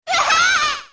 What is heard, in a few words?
hurt; snes; smas; moon